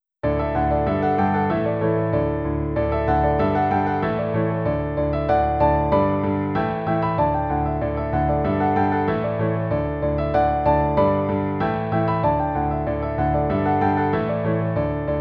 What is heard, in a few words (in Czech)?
klavirmelodie; Gorale